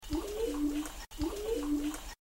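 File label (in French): pluie essuie glace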